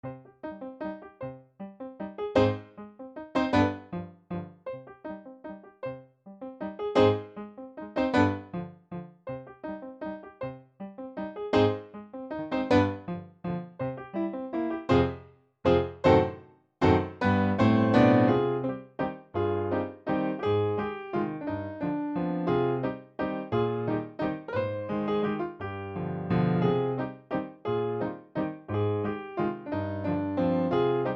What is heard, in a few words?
lounge; A Detective's Novel